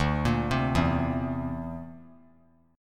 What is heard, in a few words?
D 7th Suspended 2nd Sharp 5th D; D7sus2#5 arpeggiated; JGuitar